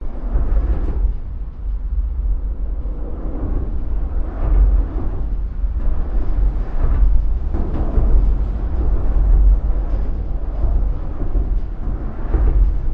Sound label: under bridge cars trucks motorcycles busses pickups vehicles traffic; vancouver; lions gate bridge; your free sounds